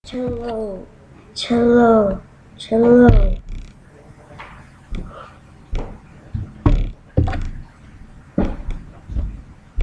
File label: cello